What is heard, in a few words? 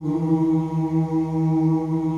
F1 MALE CHOIR, OOS; Northstar - New Gold VOL-2